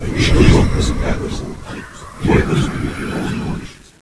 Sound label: monsters idle; oblivion darkangels